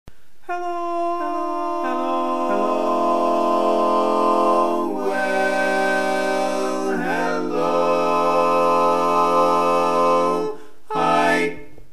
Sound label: Hello Tenor, chorus